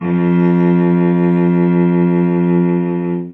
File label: F2, cello